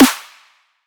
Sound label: Snare, C; Ultimate Snare 1; Cymatics